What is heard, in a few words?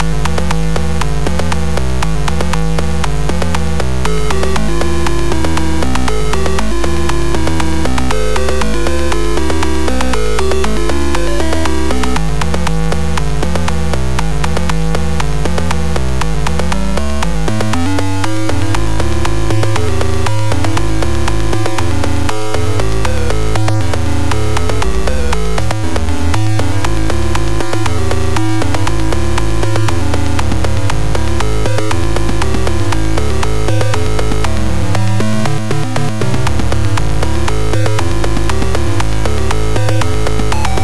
z80 snake charmer tritone; garvalf